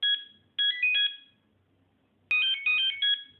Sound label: nokia 1100 persuasion ringtone